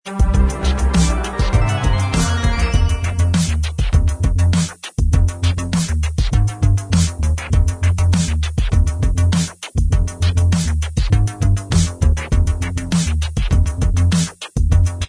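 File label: instrumental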